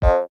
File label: Ribbit Frog Hat Jump; creatures organic